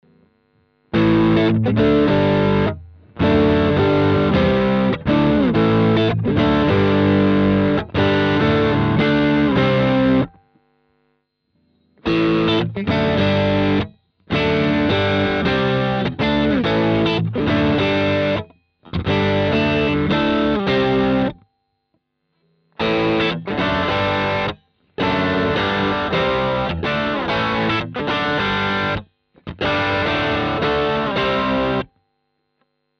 Chapman Rock Riff overdriven all positions

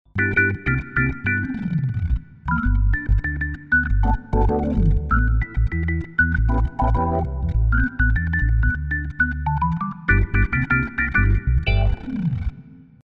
Crumar Mojo Desktop Onboard; Max Tempia

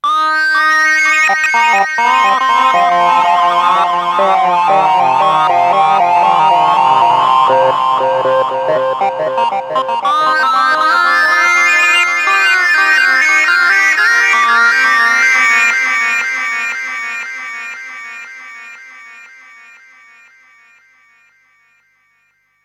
SyncLead; Harley Benton D Seed

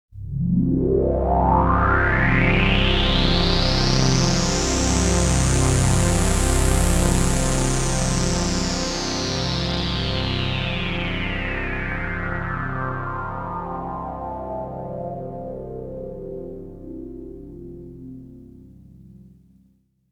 Synthesizer Sweep

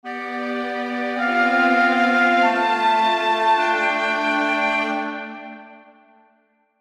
Indian Orchestra

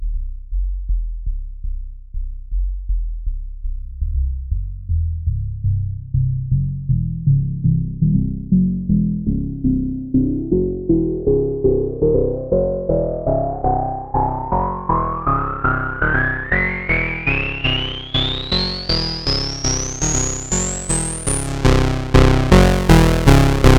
Bigbad Bass

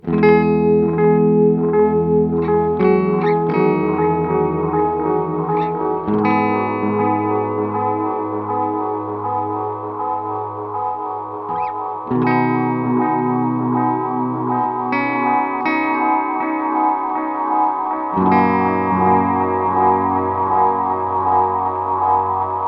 Echosex 3 Bright Setting max Delay Time; Gurus

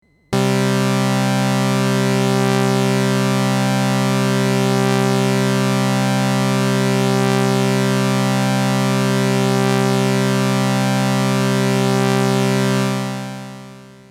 JU 06A Phasing

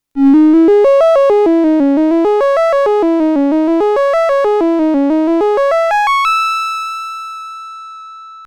Triangle Lead

